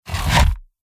symbol box in; Stampede Call of the Pride; Eyecon

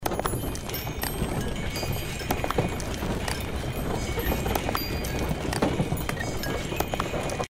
motion With Gold; Dynamite Digger Eureka

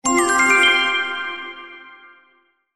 Winning Line; Dynamite Digger Eureka

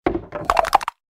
plank coconuts; prize Pick Transition; tiki-beats; eyecon

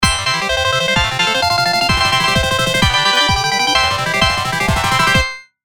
win mega; Step Back 7's

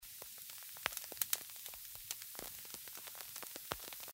fire Loop; symbol; tiki-beats; eyecon

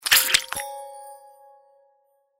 symbol win blue; Fondant Favourites StarTrail; Eyecon

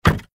reel stop; Lucky's Fish and Chips

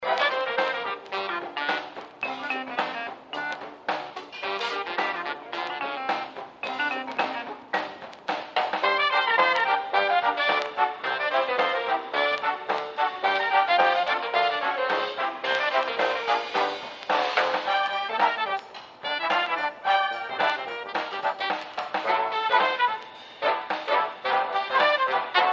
music lofi; Fruity Burst 2

